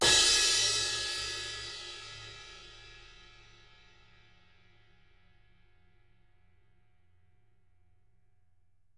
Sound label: crash